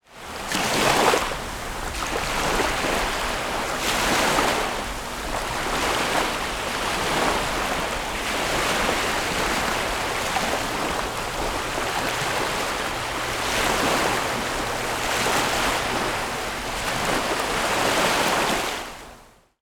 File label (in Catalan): Onades Platja